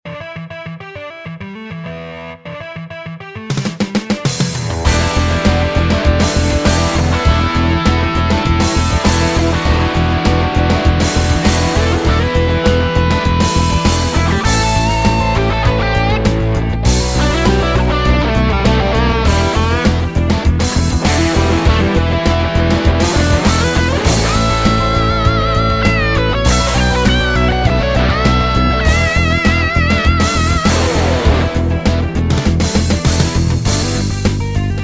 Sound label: Basketball; ZTE; Blade-L5-Plus-L0510